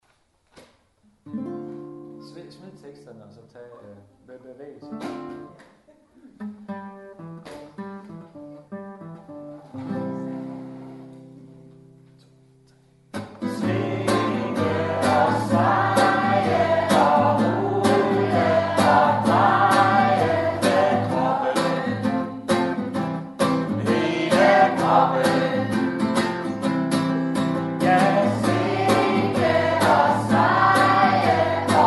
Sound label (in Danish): Sang